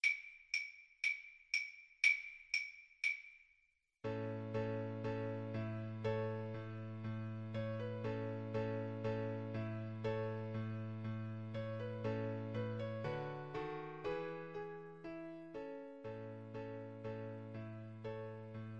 spring, String Quartet

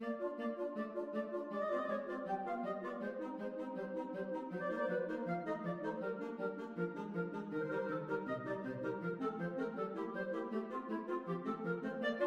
flexible mixed ensemble, 4 players

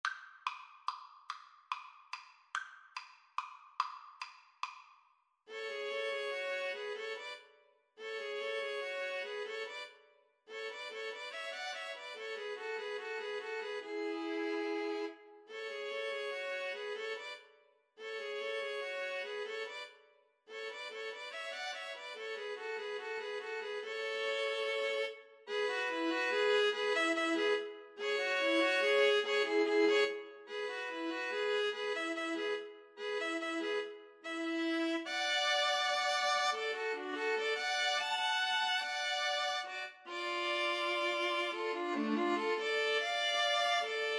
String trio

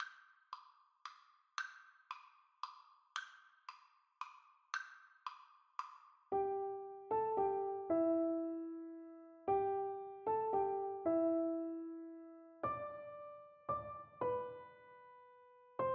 silent night violin piano duet

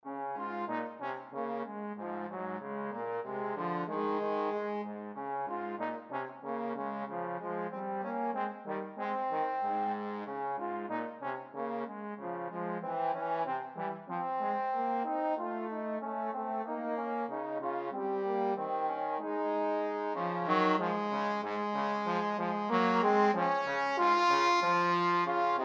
trombone duet

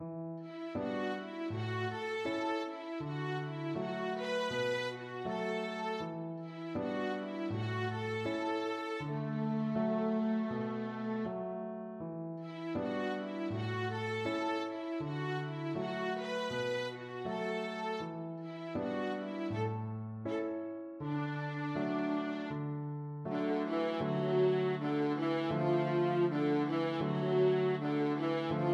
rag viola

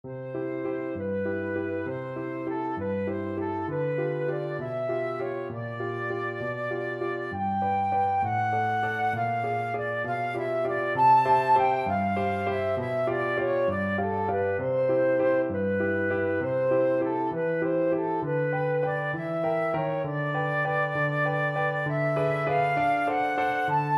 tchaikovsky waltz, oboe

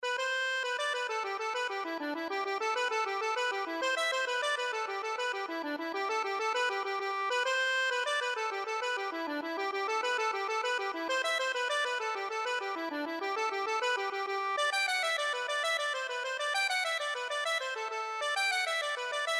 accordion

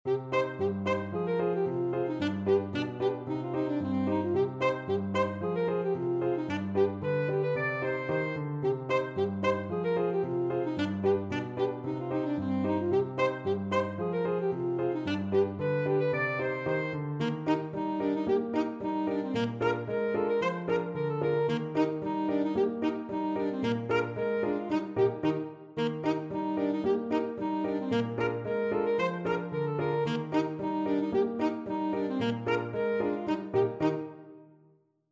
polkka ASAX, saxophone

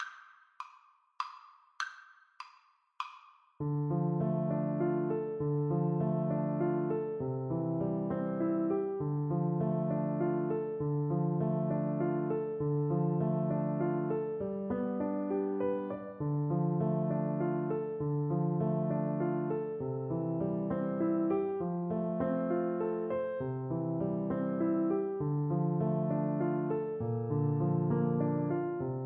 recorder

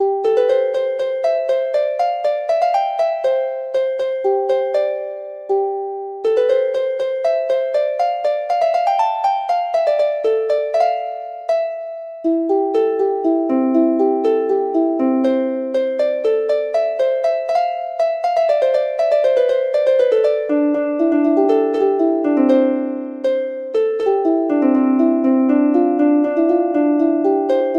harp

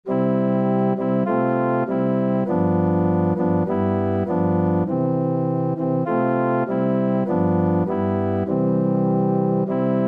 organ

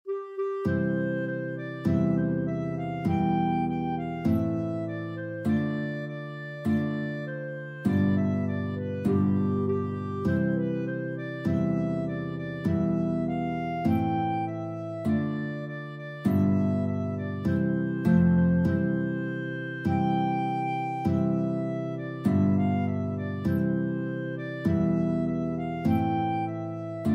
guitar clarinet duet

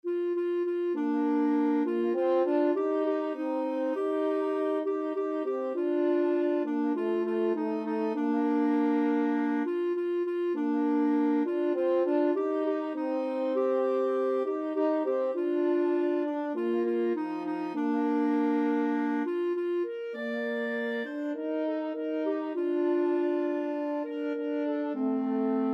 clarinet french horn duet

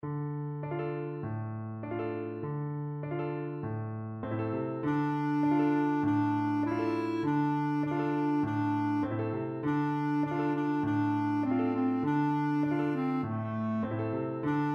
kagome kagome, clarinet

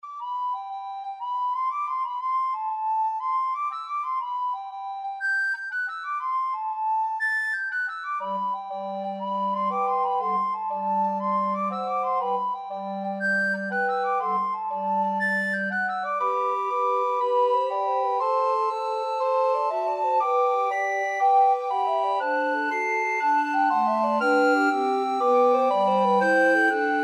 fantasia, recorder quartet

